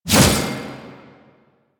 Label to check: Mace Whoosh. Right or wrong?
Right